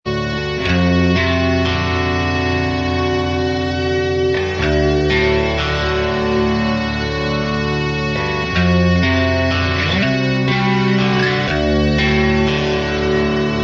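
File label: instrumental; shorter edit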